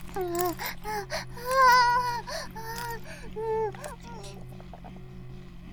バイブ放置, ASMR

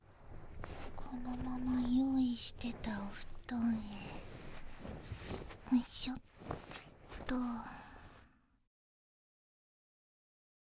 心音 + 安眠誘導（バイノーラルマイク 効果音あり大きめ）

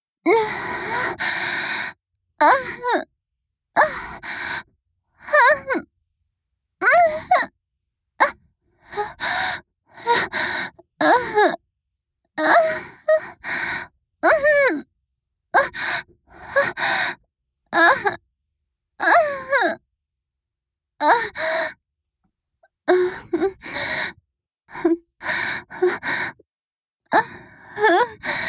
相手に優しく突かれる; 実用ボイス抜粋集

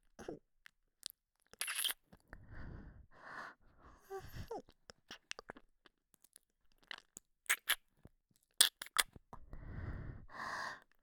ちゅっ…ちゅっ…, ASMR

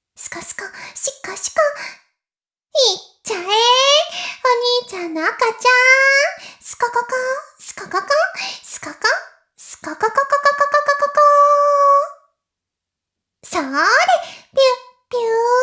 妹 しこしこ音声

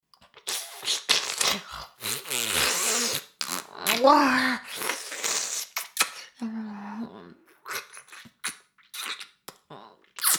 耳舐め音声(LR逆ver); 少尉; 牧場戦腺

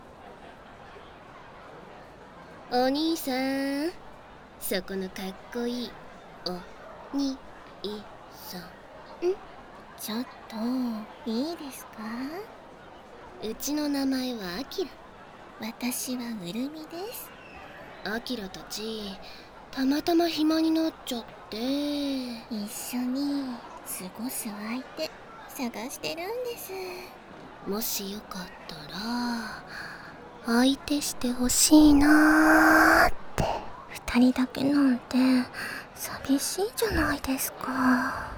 ダブルベロチュー; 媚び媚びビッチな男の娘とダブルベロチュー兜合わせ

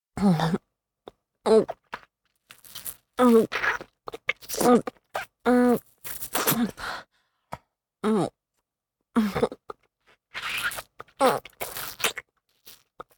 お掃除フェラ(中):陰茎を根元まで咥えて残った精液を搾り出す, チュパ音

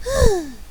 ふぅ

